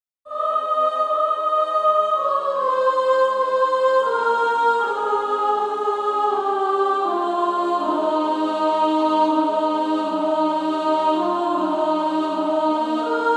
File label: Female Choir S1; 1st Soprano; Away in a manger